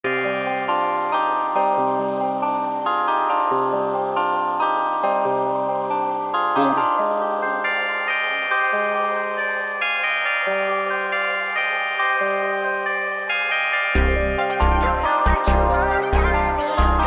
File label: HARD Trap Beat Trap Rap Instrumental Beat Dark Beat